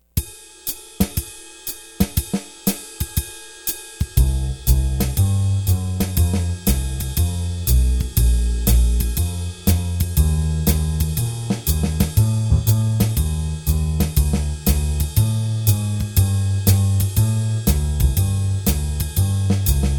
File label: Bass Drums